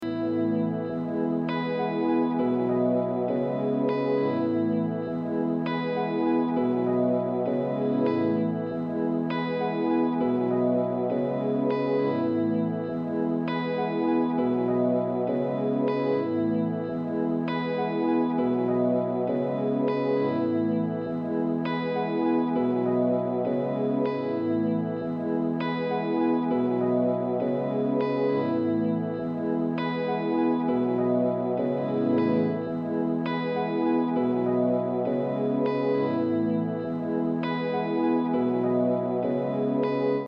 LOOP mezcla

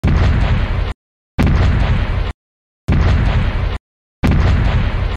Pasos de Monstruo Gigante

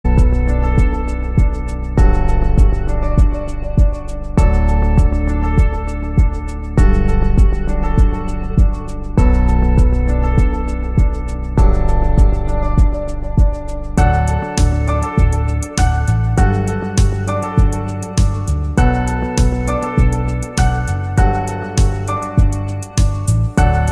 musica de fondo presentacion

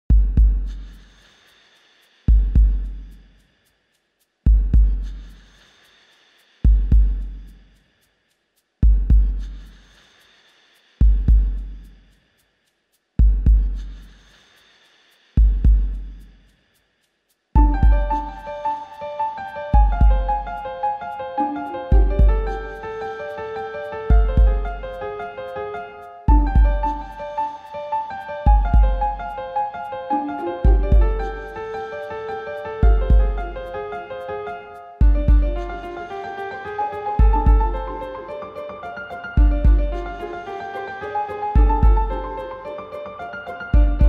Musica de detectives investigación y misterio para niños

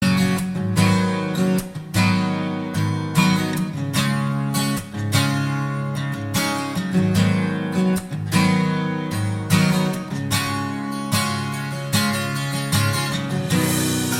Versión Karaoke

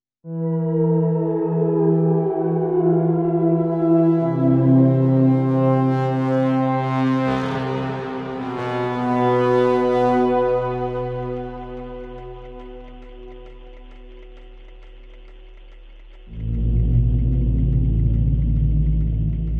musica de suspenso

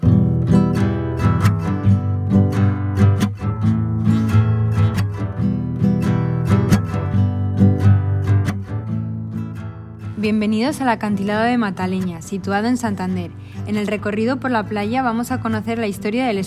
cortinilla remix

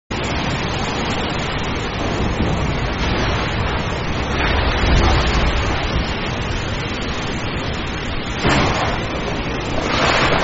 tormenta en medio del oceano sonido relajante; video ambiental

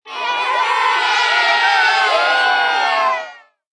aplausos

